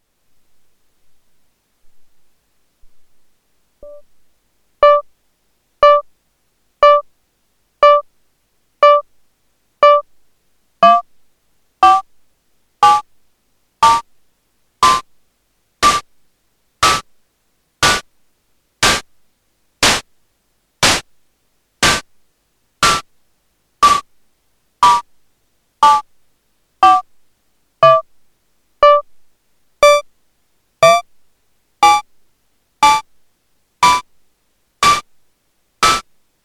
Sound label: Erica Synths Perkons HD 01 Voice 4 Noise Tone